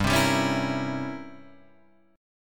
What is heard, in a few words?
C 7th Flat 9th G